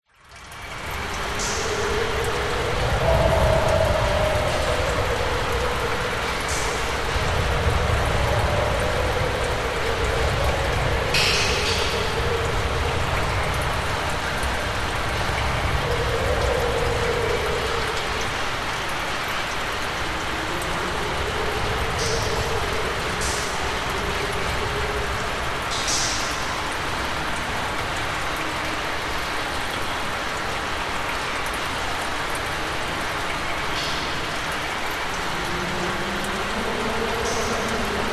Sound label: industrial warehouse abandoned strong howling wind rain metal roof leaking drips